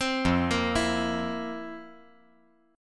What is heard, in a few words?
F Major 7th Suspended 4th